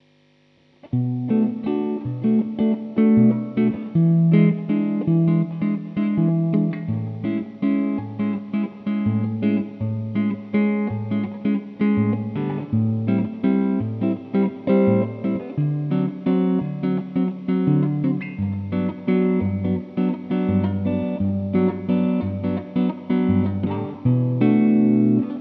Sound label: Bossa; Reverb 6PG5